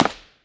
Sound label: heel click; taunt; Garry's Mod; tf2